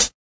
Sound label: hat; DRUMS (SINGLE HITS); Hats